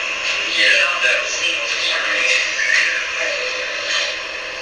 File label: whistle; Edwards; Springfield Carriage Co